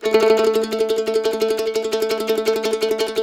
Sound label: C E trill A, Blues Guitar